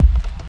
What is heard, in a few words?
dark techno kick uk style; single hits one shots; kick drum samples